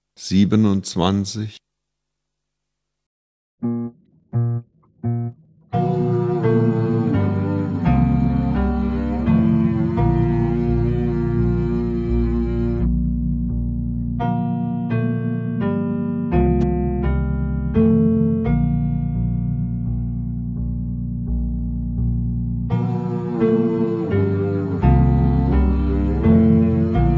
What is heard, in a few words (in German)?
Klang, Hornschule